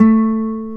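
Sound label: classical guitar, nylon string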